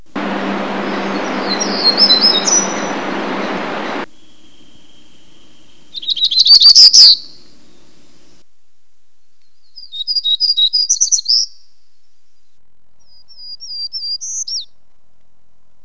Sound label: black throated gray, Hermit, Townsends Warbler